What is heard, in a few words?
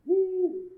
hoot, owl; owls; entity